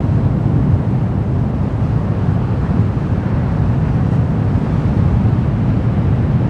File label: waterfall layer, gnarled forest ambience